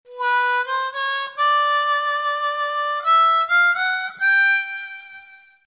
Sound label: Overblow, harmonica